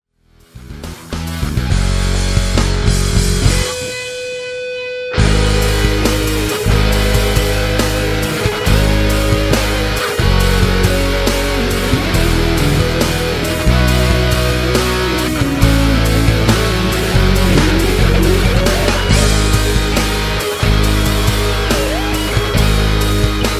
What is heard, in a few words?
another day solo